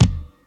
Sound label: nineties pop folk kickdrum F sharp key, Royality free bass drum single shot tuned to the F# note